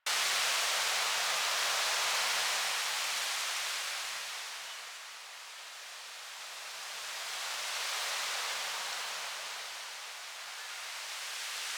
Summer Tree Wind Loop, Ambience; Warframe; Nineteen Ninety Nine

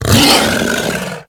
Feral Vocal Angry Attack Charge; Enemies; Kubrow; Warframe

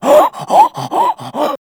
Infested Runner Breathe Quick; Warframe; Runners

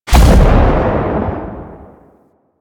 Army Proj Explosion; Warframe; Warframes; Hoplite

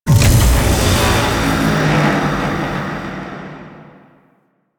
Void Dragon Ring Transference Unlock; Enemies; Warframe; Duviri

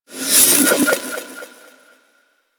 bark; Warframe; Teralyst; Vomvalyst

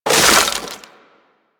Melee Impact Wood; Warframe; Weapons

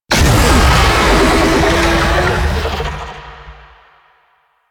Infested Oni Vocal Shield Up; Warframe

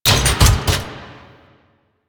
Sniper Mech; Kahl Sniper

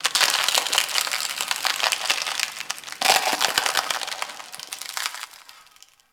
Landscape Ice Crater Creak, Ambience; Warframe; Venus Open World; One Offs

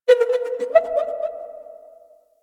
Vocalized Metal, Asteroid; Ambience; Warframe; Grineer Asteroid

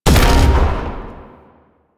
Void Dragon Beam Shot; Enemies; Abilities; Warframe; Duviri

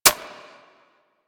Magnum Mech 2D, Weapons; Warframe; Tenno

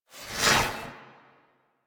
Mod Cycle Reverse; DE; Warframe; Riven Mod

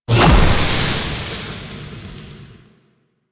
Spit Attack Impact; Warframe; The New War; Archon; Nira; Abilities

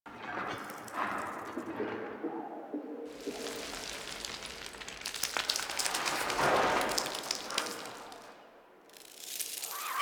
Exterior Foreground Alley Loop; Ambience; Duviri; Warframe

